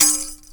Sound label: tik, pitch perc; East West - Global Sounds